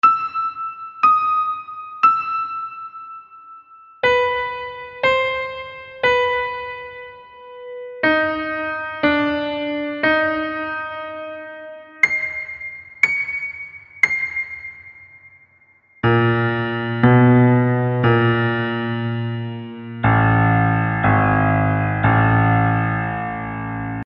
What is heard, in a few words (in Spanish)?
Ejercicios de Intervalos de segunda menor ascendetes y descendentes